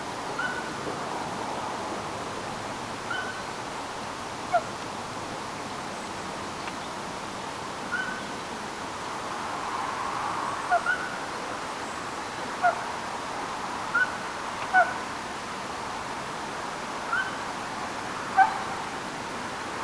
pikkukoira ja emolaulujoutsen "keskustelevat" yhdessä; pikkukoira ja laulujoutsenemon ääntelyä

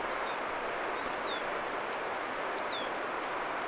ompa erikoinen vihervarpusen lentoääni; Nyt on saapunut tiiy-vihervarpusia.

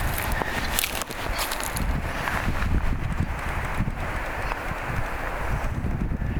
kurppa

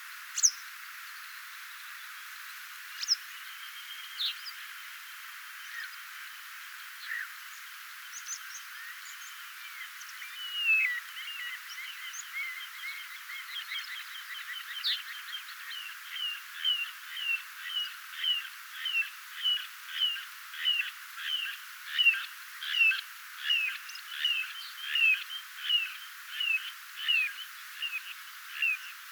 kaksi uutta lajia tanaan keltavastarakki ja pikkutylli pikkutyllin soidinta liron soidinaa; kaksi keväälle uutta lajia samassa äänityksessä; soidinaantely ym

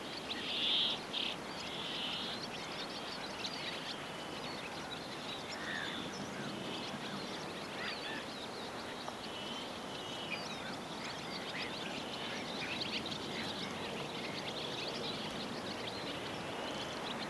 haarapääskysten laulua

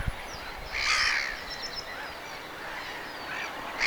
pajusirkun sae

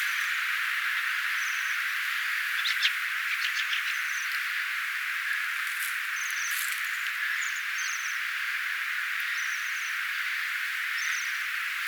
noin 30 tilhea lentaa saaren ylla aika korkealla

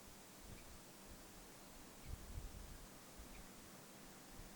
onko luhtakana

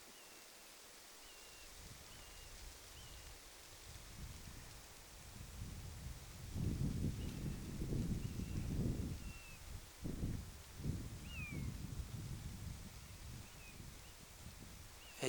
mehilaishaukan huomioaantely ilm pesan luona muistuttaa aika paljon kurmitsan aanta

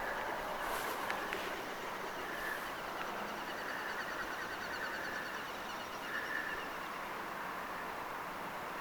telkan siipiaanta

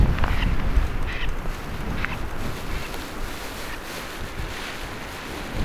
kurpan lentoonlahtoaantely

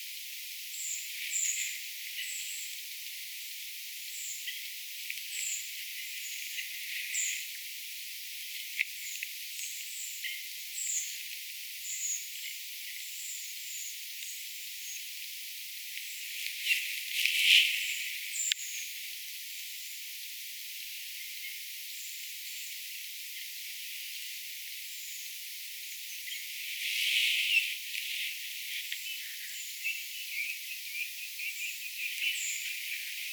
punakylkirastasparven aantelya; punakylkirastasparven ääniä, 2

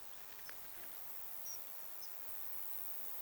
vertailu tikli ja rautiainen

